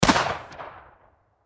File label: Gun Shotgun, Shotgun 12 Gauge Remington 870 Pump Action Single Shot; Distant Perspective; Warfare; Blastwave